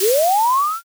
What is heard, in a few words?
firework launch